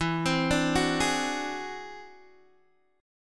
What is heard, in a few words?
E Augmented 9th E down Guitar DADGAD